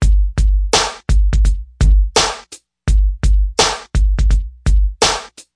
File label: hip hop plain vanilla gated sd, 084 bpm, drum loop